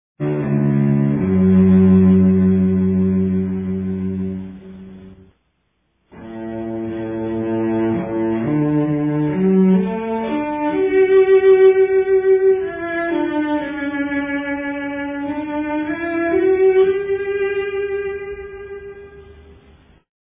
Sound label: cello excerpt